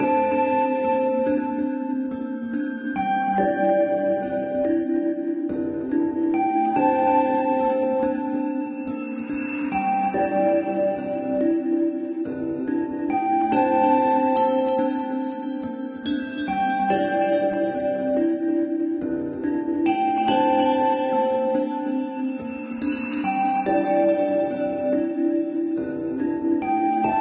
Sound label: Theme; ruu goblin quest; Naomi Carrigan